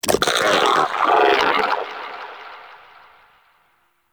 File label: Vocal Angry, Shrimp Lantern, Creatures; Baliq